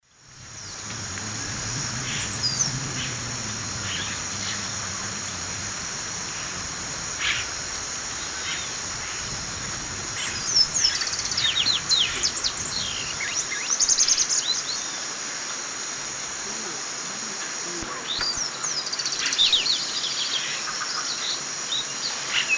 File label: Molothrus bonariensis, song; S. America; Eastern Lowlands